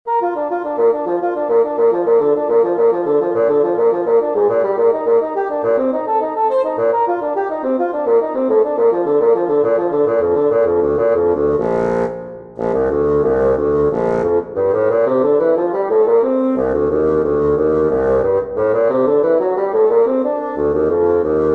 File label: for bassoon; bach johann sebastian; fantasia major